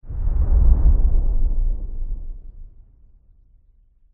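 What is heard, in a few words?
woosh heartbeat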